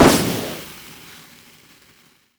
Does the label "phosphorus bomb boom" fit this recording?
Yes